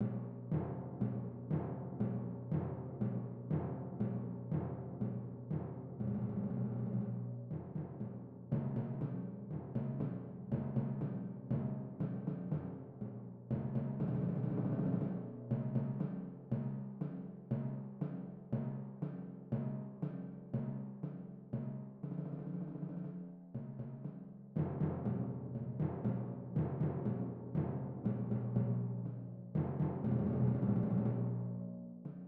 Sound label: duet for timpani